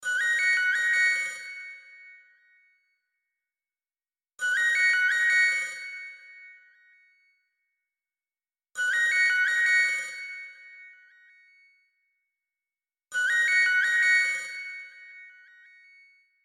baby singsong

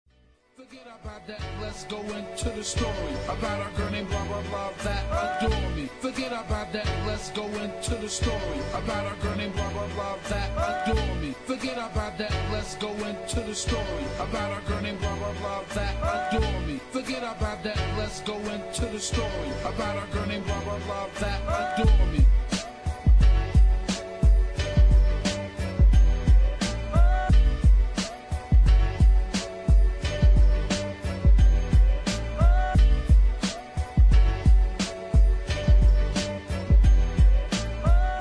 old school sampled rap beat with vocals on hook

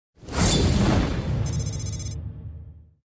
sms

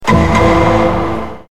gavel; law and order

